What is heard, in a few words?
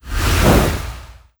idle, monster; patren